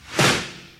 sizzly steel snare drum sound C sharp key

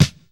smooth steel snare drum sound D# key

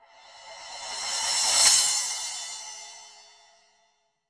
crash one shot a key; tuned cymbal

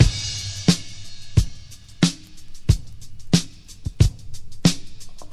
90 bpm breakbeat f key, kick tuned to the F note

